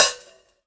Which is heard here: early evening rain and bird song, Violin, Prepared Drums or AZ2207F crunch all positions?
Prepared Drums